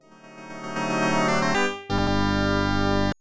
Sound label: Level Start; Ghouls n Ghosts; Genesis